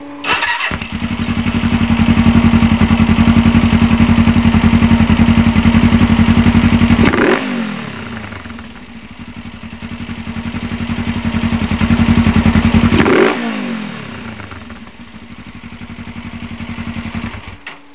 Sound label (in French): moteur; refection moteur; remontage